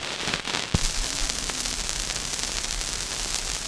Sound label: Vinyl Old 78, Noise; layering